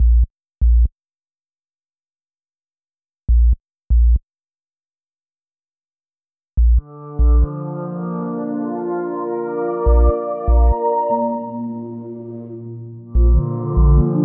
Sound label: Loaming Pulse, Ambient; Panoramic